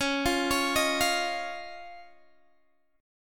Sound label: C sharp Major Add 9th, Guitar, Open G